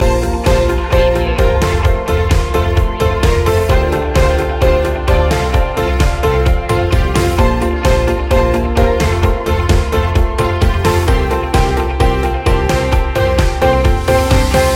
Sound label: corporate awakening loop